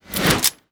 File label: Menu Install Mag; Custom UI Sounds; Call of Duty Black Ops 3